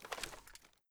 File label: rattle, weapons fal; cw20 extras